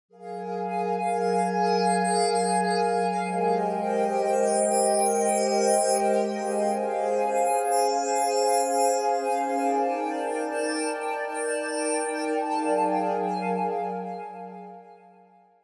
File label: pad